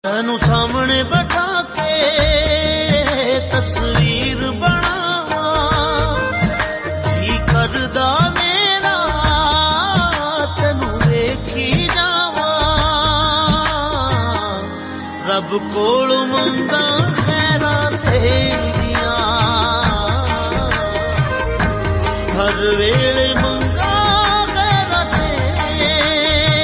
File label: Punjabi Ringtone